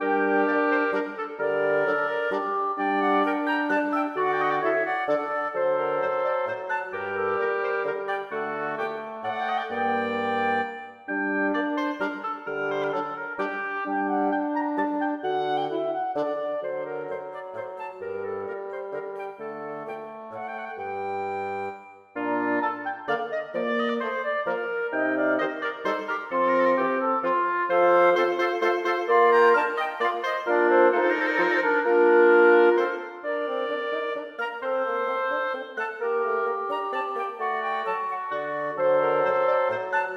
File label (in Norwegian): Menuet for blåsekvintett